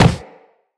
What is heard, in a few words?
Run Wood, Footsteps; Characters; Operator